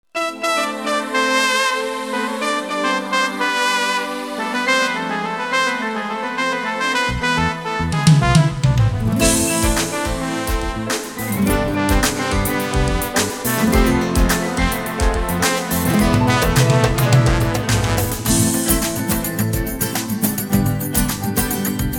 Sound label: instrumental; persian